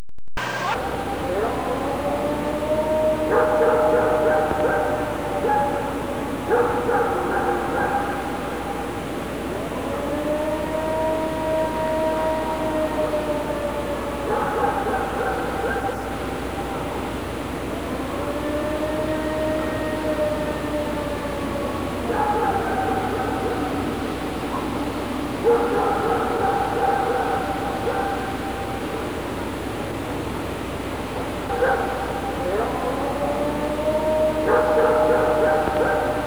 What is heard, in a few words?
Howl